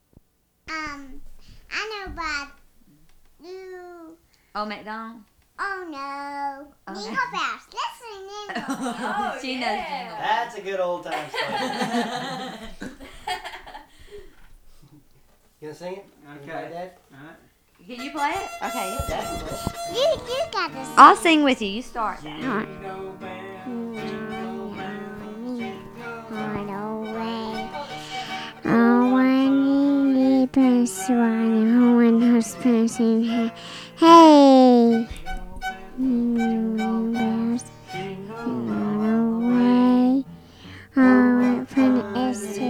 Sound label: Jingle Bells